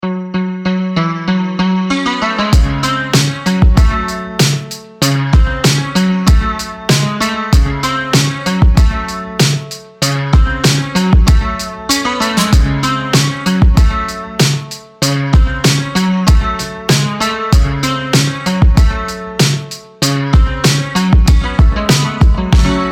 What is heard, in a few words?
hiphop